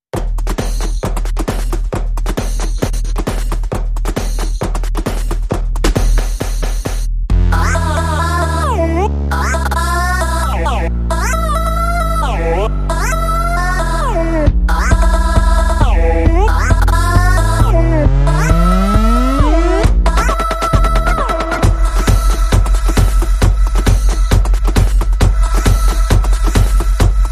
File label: No Snare